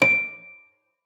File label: FLAG C#7, harp; Xsample VOL-8; HARP+FX